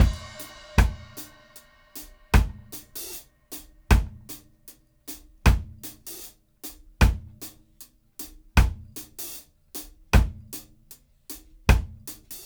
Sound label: Reggae Dub 77 bpm, Drums Full Loops; Ableton Live 12 Lite; Core Library